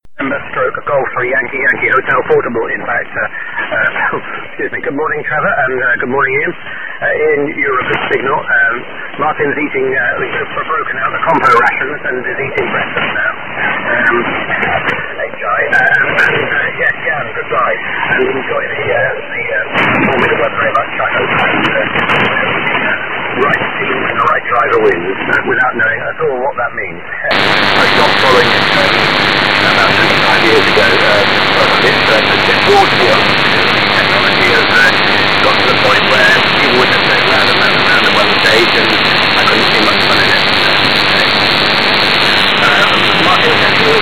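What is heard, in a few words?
PUPPY LITTER, coyote; Noise-on-signal composite recording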